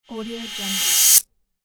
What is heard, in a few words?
cymbal reverse